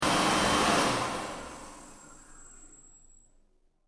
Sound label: Hand dryer off, Hand Dryer: Automatic, Public Washroom, Switched Off; Miscellaneous Electronic; Electronics; Stuart Duffield